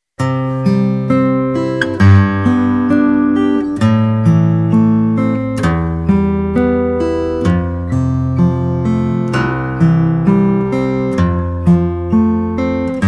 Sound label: canon